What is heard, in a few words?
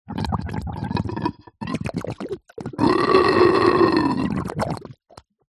nature water gurgle light shallow bubble